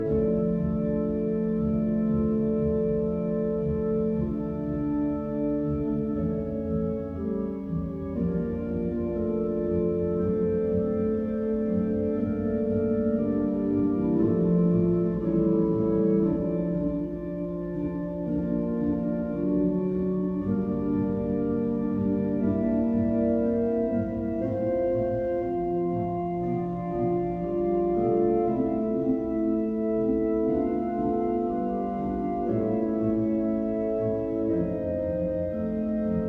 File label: Prelude Loop